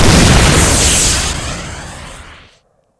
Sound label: rocket